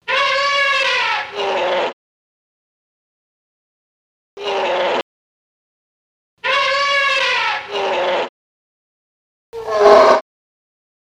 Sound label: Elephant Sound; Rainforest Cafe